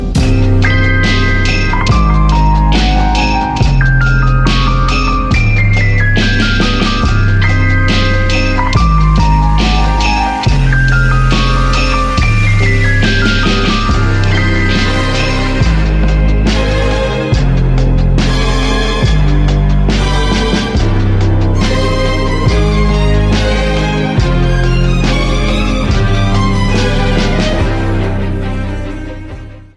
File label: THEME SONG RINGTONE, INSTRUMENTAL; SUCCESSION